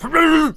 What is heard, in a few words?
Blunderbuss Attack Vocals